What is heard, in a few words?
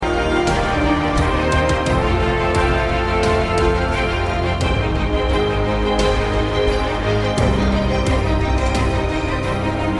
ENERGETIC MENU + SHAKERS Short